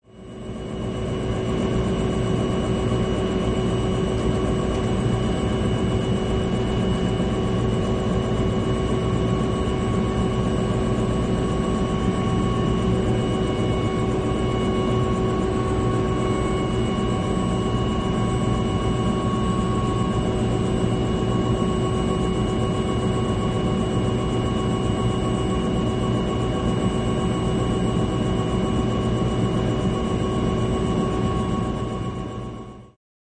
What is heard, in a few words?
Jet Ranger: Idle. Interior Perspective; Jet Ranger: Idle. Interior Perspective. Stereo